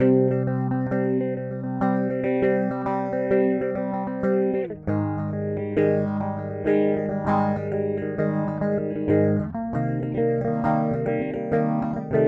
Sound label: Wampler Terraform Stereo Phaser